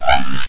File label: houndeye pain; hl2